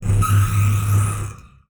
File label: Transition Electrical Distortion; Production Element Title Transition